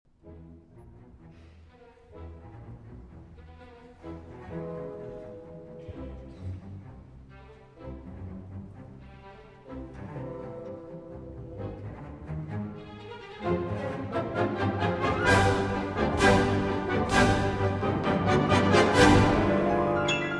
Piano Concerto in C Minor, Allegro Scherzando (Live); Live in Australia, 2003